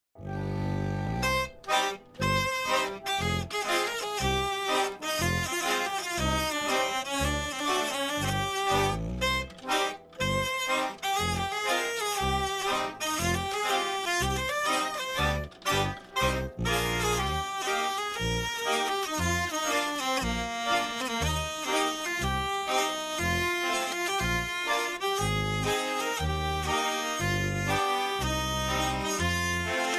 hurdy gurdy

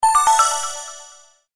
Warning Notice

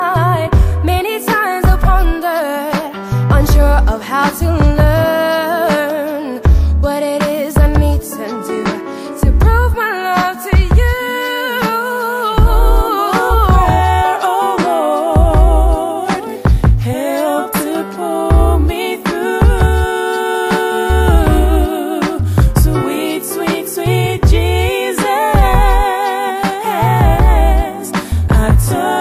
Humble Prayer

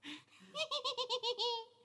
Funny Laugh

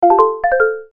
Alarm Tones

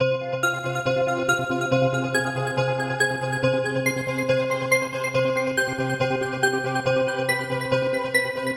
Bass Sample

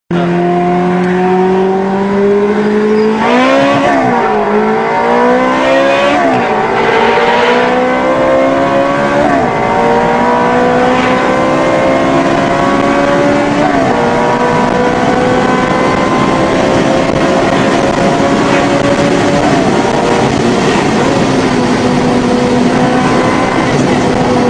Ferrari 360 Modena

